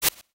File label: Rotate; Puyo Puyo Puzzle Pop; iOS, macOS, mobile